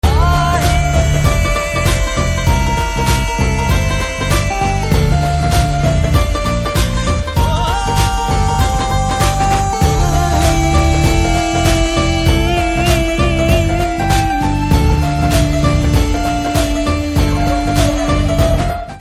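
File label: Theme background music Telugu Ringtone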